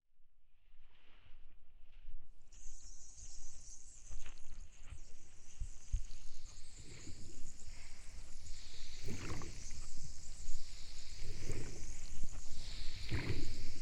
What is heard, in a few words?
sonar jug; waterline